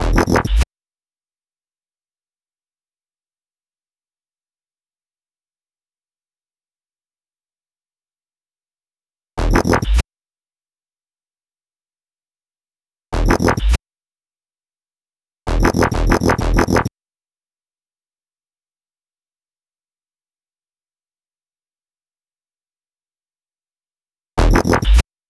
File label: 128 BPM Rap To The Top FX Wow